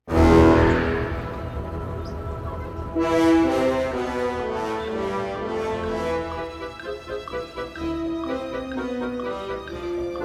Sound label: Clock Is Ticking; My Little Pony OST Repository; Season 2; Incidental Music